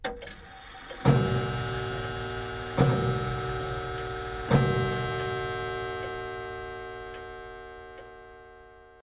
Grandfather chime; Phasmophobia

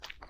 Wet footstep; Phasmophobia

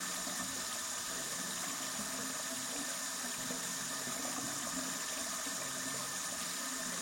Sink loop; Phasmophobia

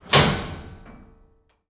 GATE Metal Close stereo; Phasmophobia

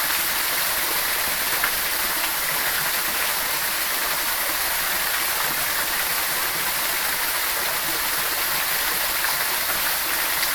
Heavy Rain Sound; Phasmophobia

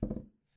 wood; Phasmophobia

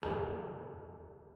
Step; Phasmophobia

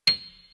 Piano Key; Phasmophobia

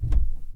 Underneath stairs footsteps; Phasmophobia

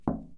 Window Knocking; Phasmophobia

